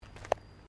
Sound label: FOOTSTEPS THONGS (FLIPFLOPS) CONCRETE STEP; Footstep Foley; Footsteps (Sound Effects:Human)